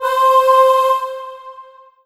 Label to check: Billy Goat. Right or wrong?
Wrong